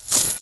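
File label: armor medium, item; The Elder Scrolls III - Morrowind; Morrowind GOTY GOG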